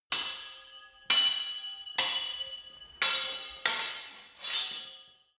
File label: sword hit sword sequence